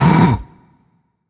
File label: breath; fenrir